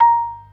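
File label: PERC D sharp 3; Best Service; Advanced Media Trax Modern Composer; B3 FULL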